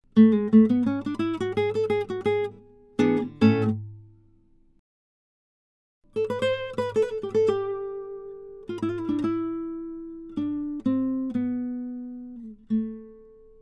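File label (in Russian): короткие мелодии на испанской гитаре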